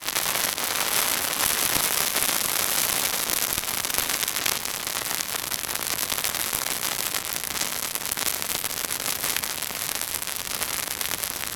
fire bonfire sizzle crackle pine branch burning wood